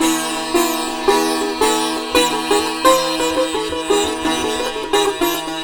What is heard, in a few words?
SITAR, Indian Traditions